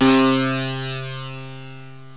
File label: analog syn, keyboards; Zoom - Release 2; Active Software